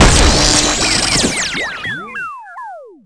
hit video game; hits; Tony Hawk's Project 8; XBOX

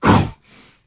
roar

